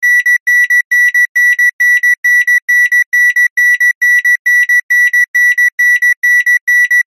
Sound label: household alarm clock beeps; zapsplat